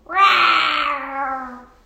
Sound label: Roar